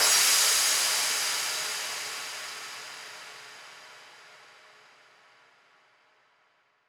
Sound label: Crash 140BPM; Drums & Perc; Vengeance Samples; Incast Kit; E minor